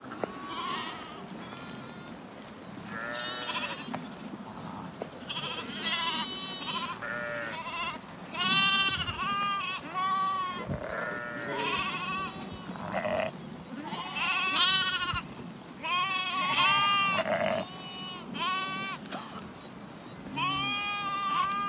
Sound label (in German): Schafe auf der Weide; Wanderschäfer - Harburg; 24-Bit 192 kHz